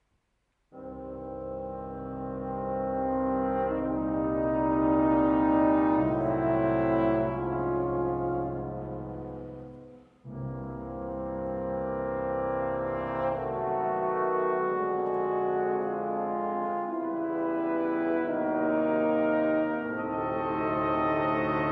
Symphony in C Minor, Andante - Allegro

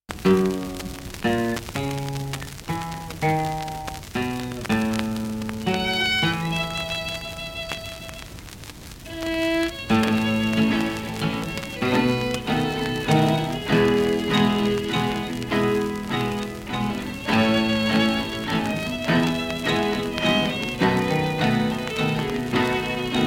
Portuguese String Trio, Valsa; Abrew's

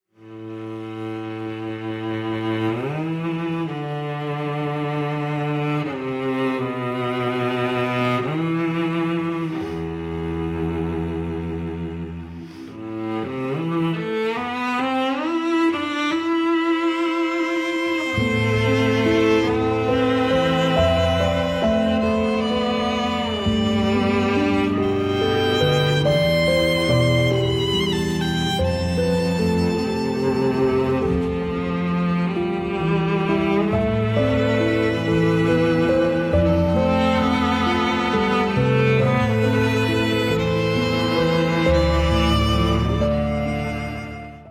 (Piano & Strings)

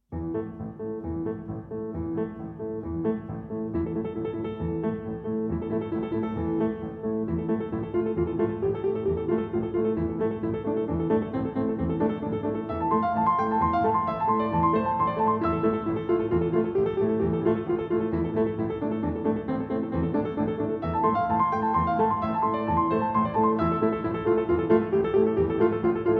Piano Duet; Orphée; Act 2 - VII. Interlude Musical - Le Retour Chez Orphée

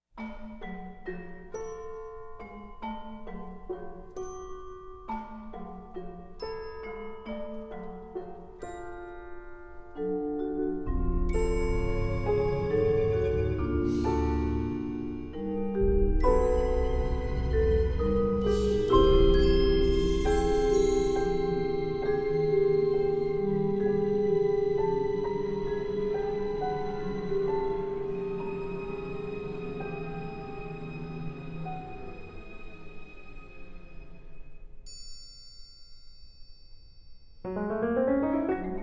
Percussion Concerto

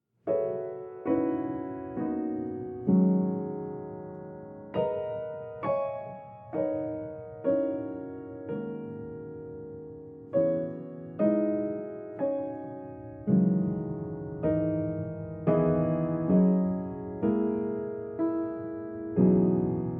Improvisation

